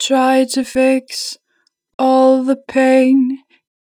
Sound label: Natural Vibrato, Vocals, Try to fix, 2 bar vib; Harmor Vocals